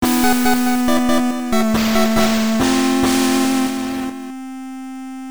Game Over Jingle; pop'n music GB

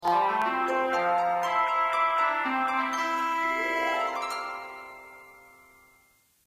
Musical

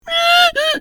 Pigfall; bad piggies; android; mobile; windows

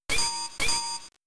Metal Claw

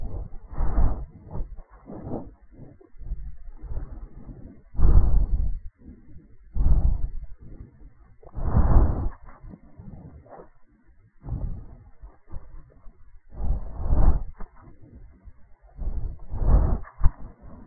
animatronic breath; Five Nights at Freddy's; FNAF 4

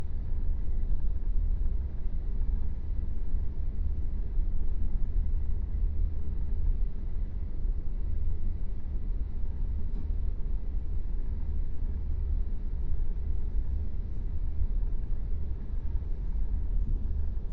Ambience Loop

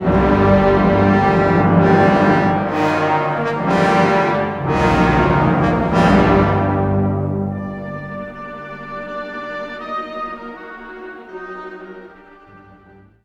Defeat; Hoth Trials Bright Hope

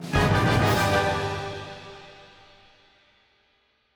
Regal Fanfare Stinger

